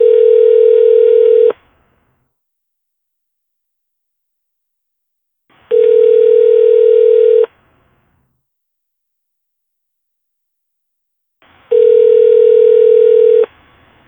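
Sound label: a phone call phone ringing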